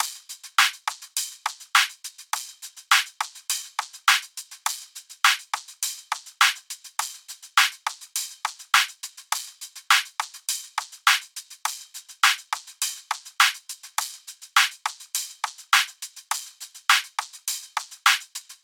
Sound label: Drum Loop, 103 BPM Percussion; Loop Stems; Cymatics; DOPE DRUMS VOL. 1; Street